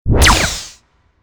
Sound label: Sci Fi Laser; SoundsCrate